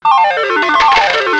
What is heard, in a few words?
slots; crimecity